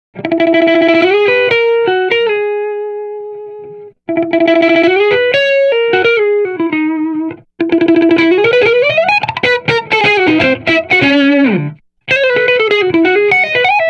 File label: raw solo